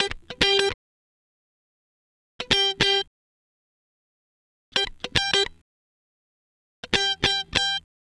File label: Guitar Licks